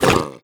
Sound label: grunt soft, creatures; vox